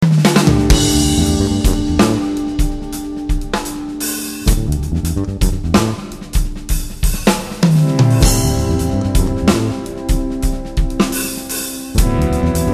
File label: Full Track Fusion